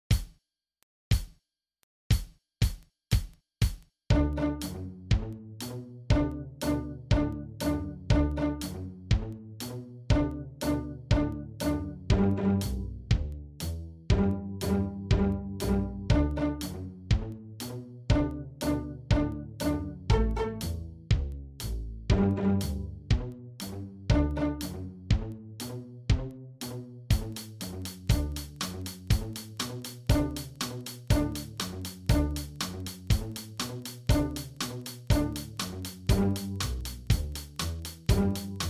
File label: Happy Blues Midi